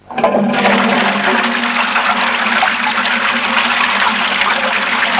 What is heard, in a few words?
flush toilet